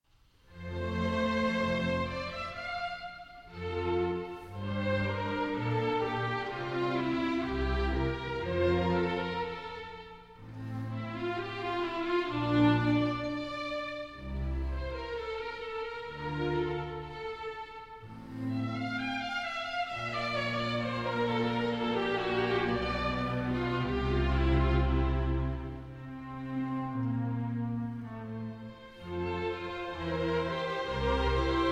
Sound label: Cello Concerto No. 1 in C Major, Adagio